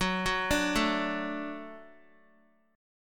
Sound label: F sharp Minor Sharp 5th F sharp Guitar; F#m#5 arpeggiated; x,9,6,7,9,x